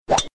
drive sfx; RACE FLASH

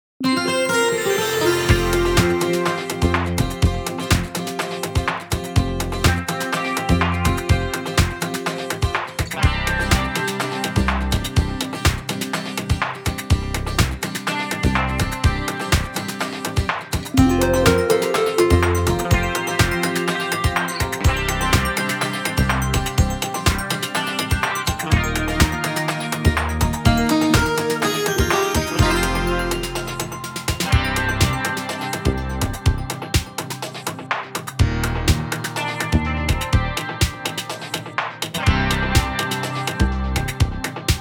Morning News Main Theme 124bpm KEY F Full Mix

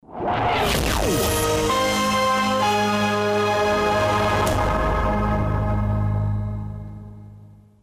Update Chime End

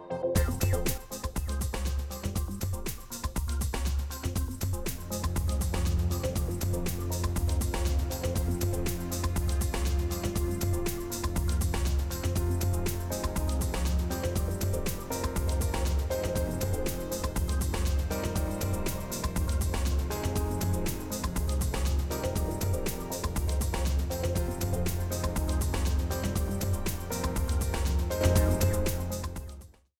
BED FADEOUT; INDY

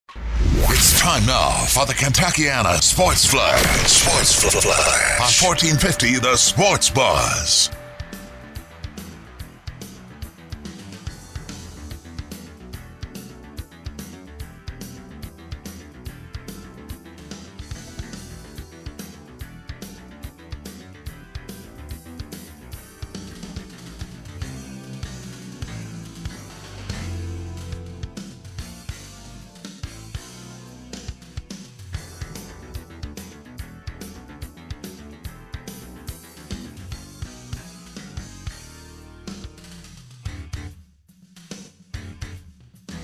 BUZZ STINGER BED

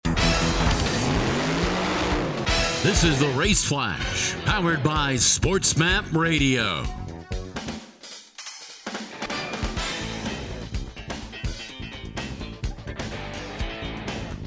BED; RACEFLASH

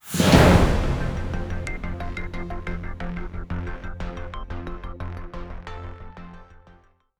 News Opening Sounder; Westwood One

